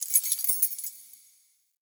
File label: Key Pickup; Objects; Pickups